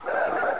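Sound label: zombie alert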